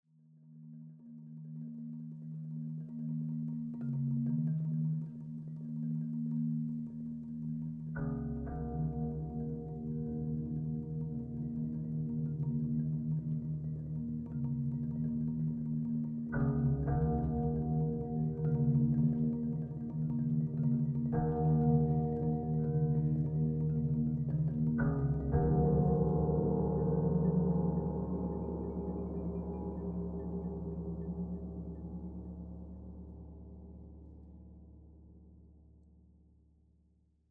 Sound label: Drum and Harp; The Last of Us; Drum and Harp Mood